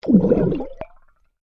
UNDERWATER BUBBLES BUCKET SMALL, Air released from under a submerged bucket; Nature